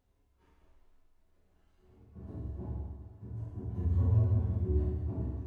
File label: Bass